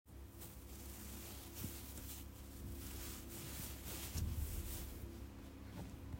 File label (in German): Kleidung rascheln